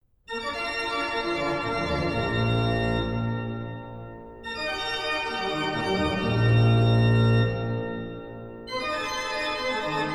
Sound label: Praeludium et Fuga in A, ORGAN; BWV0536